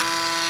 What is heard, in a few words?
floppy sound fdd5 full isolated